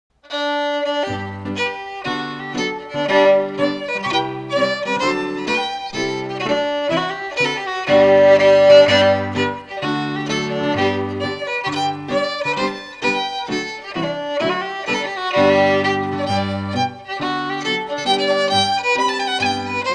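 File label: Strathspey, Scottish Fiddling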